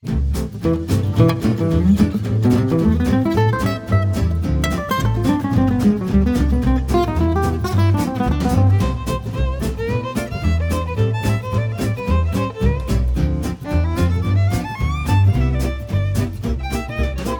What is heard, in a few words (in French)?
Musiques rapides